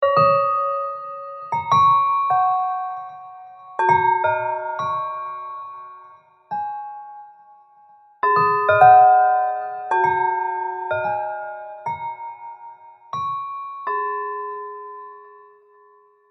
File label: Village Bell; The Unfinished Swan